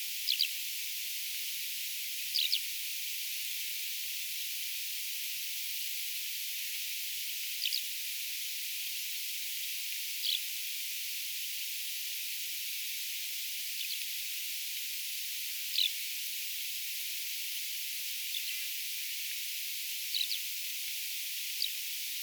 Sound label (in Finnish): kiuruja on yhtakkia myos ilmestynyt